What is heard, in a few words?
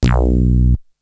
BASS Synth bazzz